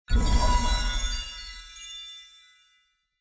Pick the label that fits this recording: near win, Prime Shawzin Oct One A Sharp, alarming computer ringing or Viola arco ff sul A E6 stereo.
near win